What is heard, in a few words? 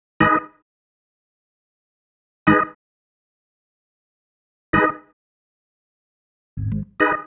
Organ Stab; Club Joints and Street Anthems; Lions Den